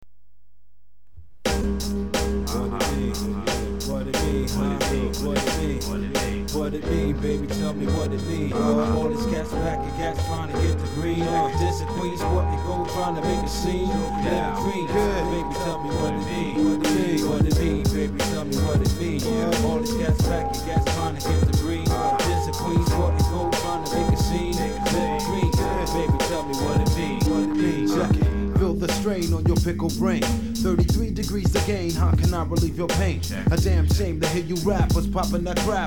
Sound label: hiphop